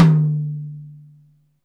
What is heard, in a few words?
JAZZ HI, TOMS; Back In Time Records; Big Bang